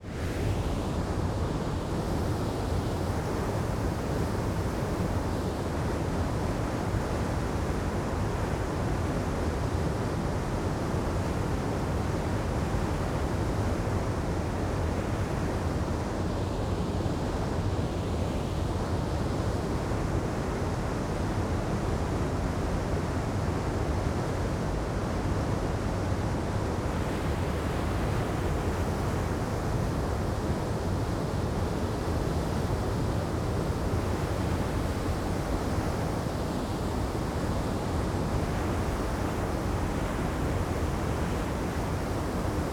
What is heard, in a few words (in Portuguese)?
Cachoeira Vale da Lua em buraco bem proximo ruido grave